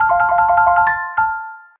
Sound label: Shipment Sent